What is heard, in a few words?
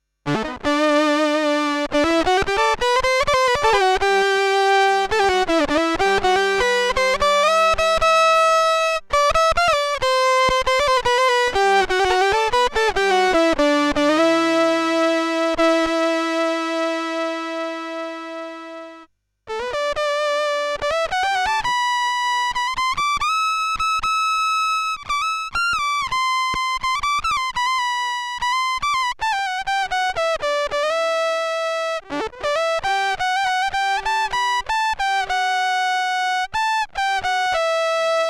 original GR 300 Lead